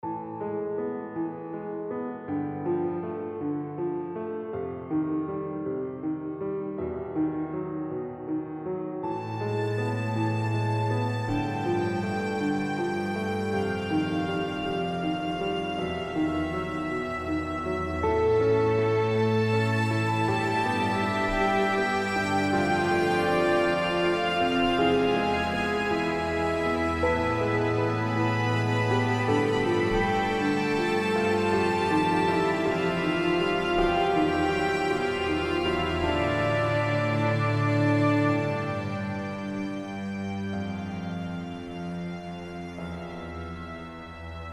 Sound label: all angels orchestral